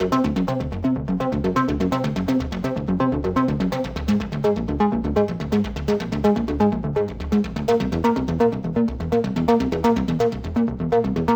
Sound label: Minimal Move 125bpm